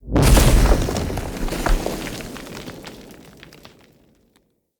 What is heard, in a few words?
Circade Drunken Flame Pillar Burst, Enemy Abilities